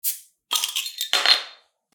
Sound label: open bottle